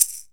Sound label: MARACAS; Big Fish Audio; Ross Garfield Drums VOL-2; GM PERCUSSN